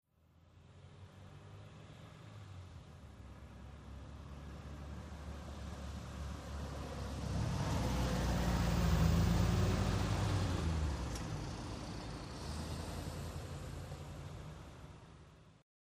Car Two Car Bys

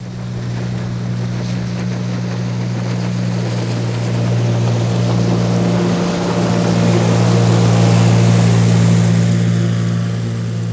Boat 10 Hp By

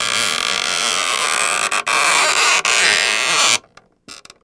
Door Creaking Thin and Tight; hinges & creaks

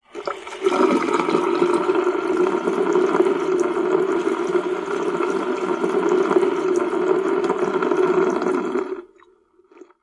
sink run water slow

